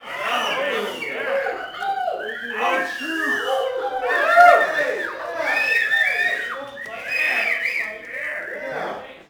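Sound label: Boxing Crowd Small Chatter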